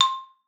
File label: C5, XYLOPHONE; Xsample VOL-4